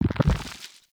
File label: Nunchuck Pulse; Warframe; Infested